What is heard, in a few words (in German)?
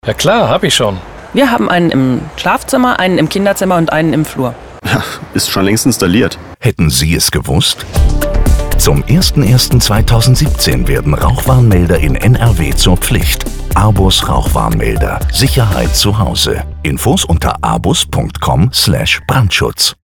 Funkspot Musik; Abus